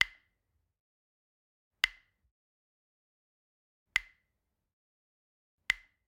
Generic Click Glass Tiny

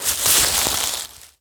Bodyfall Leaves; SFX Kit; Bodyfalls

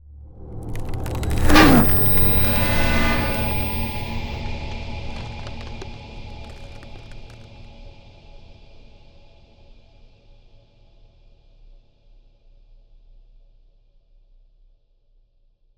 Ice Spell Whoosh, Cold Whooshes; Arctic Aura; EarBreeze

